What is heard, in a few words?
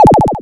signal; cavernsoffire